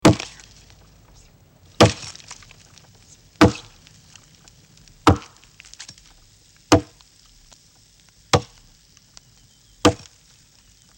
cutting down a tree; enough records

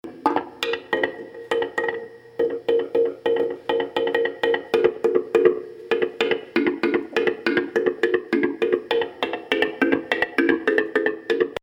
wood block

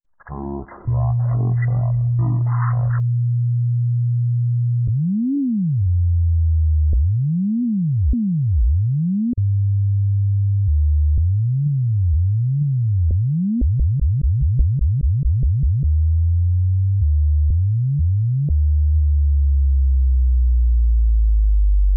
bass test; thegathering18; freestyle music

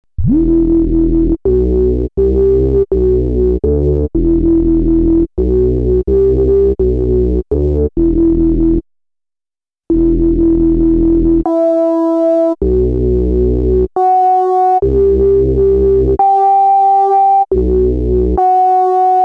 harmonics

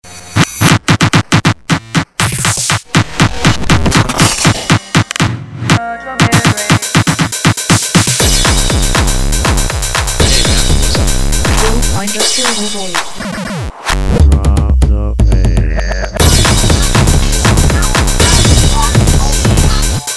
8bit acid

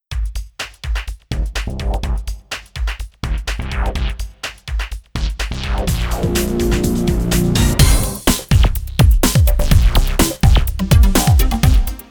80s once again; revision12; streamed music

